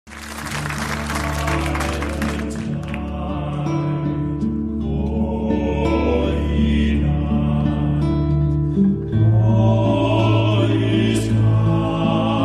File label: Tenors